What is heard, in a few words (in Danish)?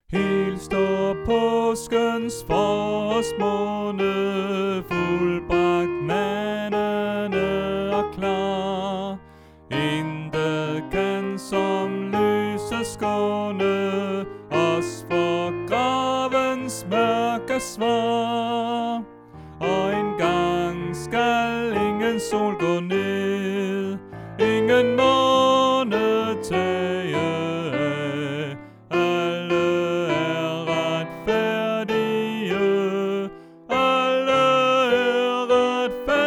Sopran